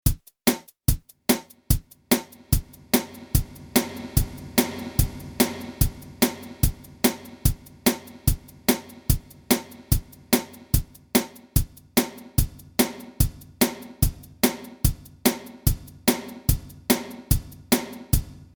fx reverb

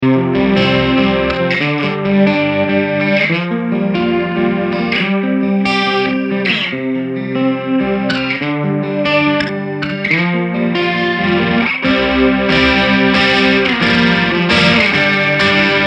soft pitchshift delay

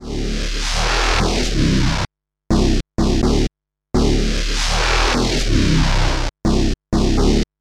Ableton Wavetable Bass; BS Epilogue

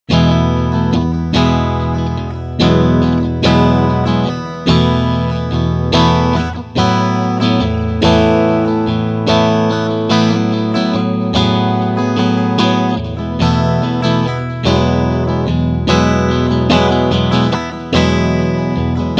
Strumming front singlecoils

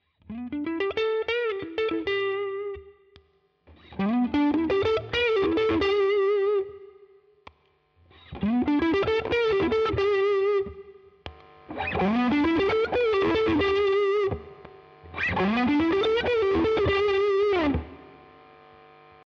Strat; 12 Uhr Gain 12 Uhr Tone STOCK

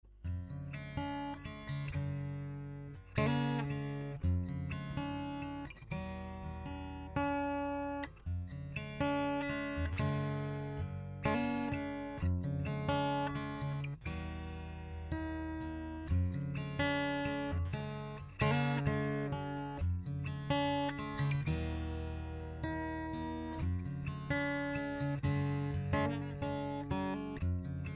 114ce DI Finger Arps; LTD

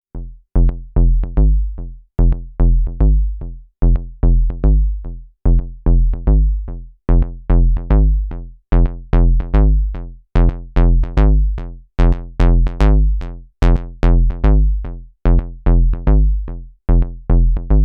Moog Subharmonicon Patch Square Sub

